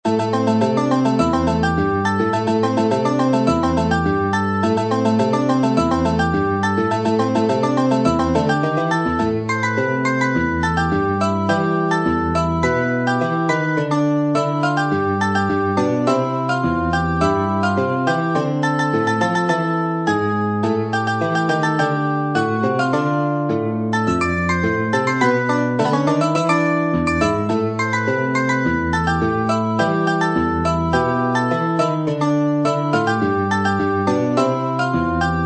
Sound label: skipped beat